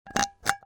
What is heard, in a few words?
CAN OIL